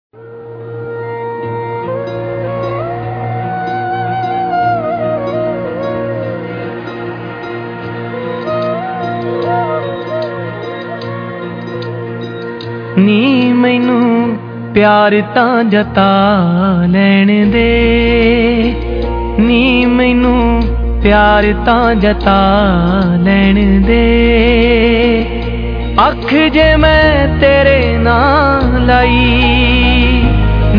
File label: Punjabi Song